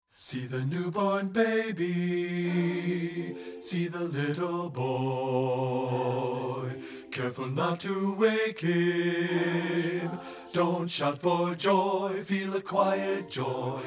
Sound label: BASS; satb